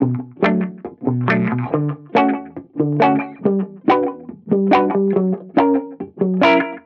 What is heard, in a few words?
Reggae Guitar Loop 140 BPM D Maj; Vibrations; Cymatics; Vibrations Guitar Sample Pack